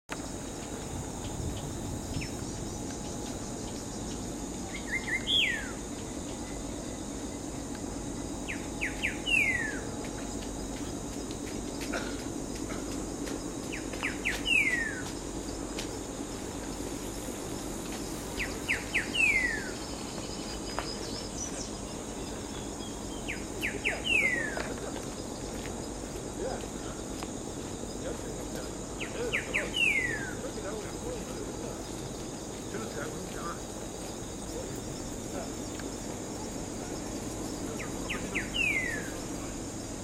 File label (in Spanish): pepitero gris